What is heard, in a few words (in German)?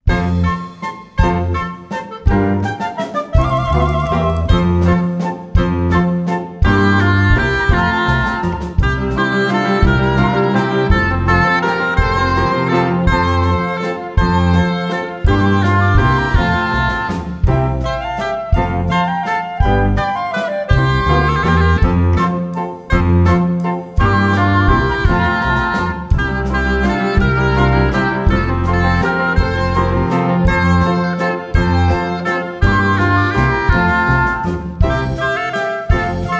Kuckuck Instr